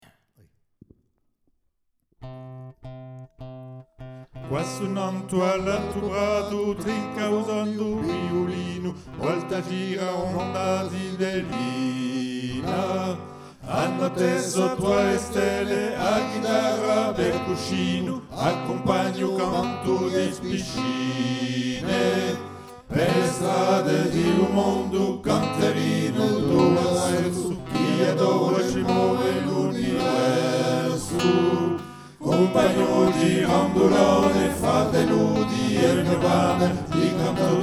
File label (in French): 3 VOIX; repertoire de a manna